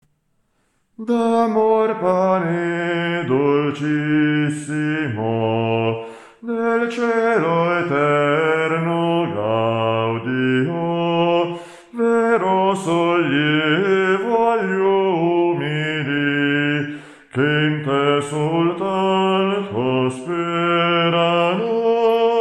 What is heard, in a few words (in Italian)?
contralti; D'Amor Pane Dolcissimo